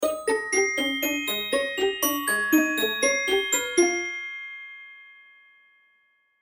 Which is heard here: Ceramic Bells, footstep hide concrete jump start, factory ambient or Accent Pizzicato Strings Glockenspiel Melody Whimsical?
Accent Pizzicato Strings Glockenspiel Melody Whimsical